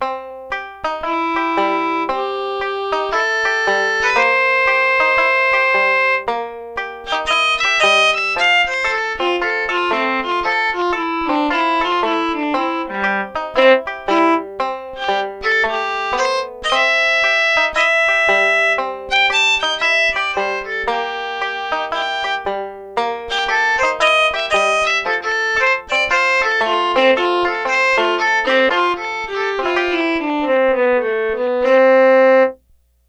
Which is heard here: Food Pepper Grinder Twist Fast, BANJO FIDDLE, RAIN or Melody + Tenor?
BANJO FIDDLE